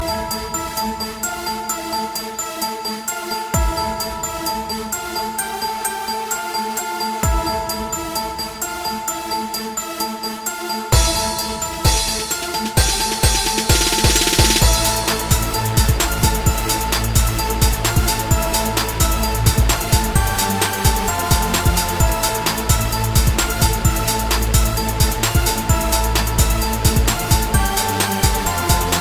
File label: rave